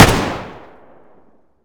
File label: m4a1 fire